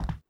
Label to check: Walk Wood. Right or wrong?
Right